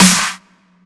Crispy Snare Sample F# Key, snare drum tuned to the F# note